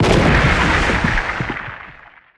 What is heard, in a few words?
asteroid damage carbonic huge; Factorio